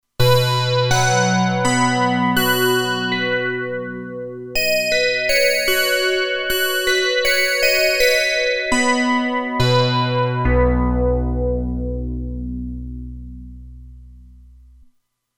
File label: Bell-Chime, Roland Alpha Juno-2; Original Sound Chart patches